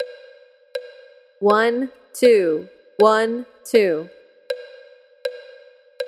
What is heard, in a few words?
Quarter Notes